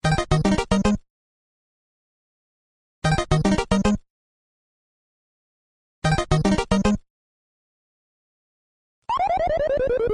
iphone 3g duck hunt ringtone

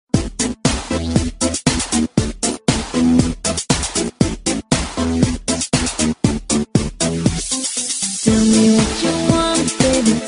samsung tell me tone ringtone

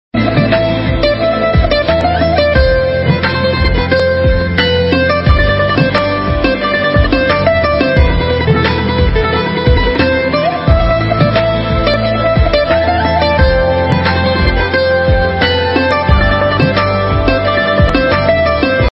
instrumental ringtone; half girlfriend

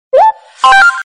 samsung sms ringtone

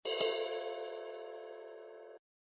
iphone 6s quiet chime ringtone

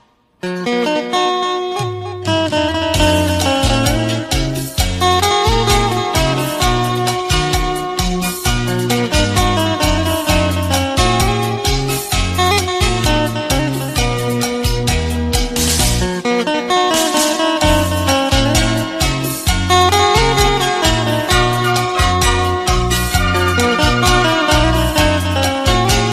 solo guitar ringtone; i phone 7 plus

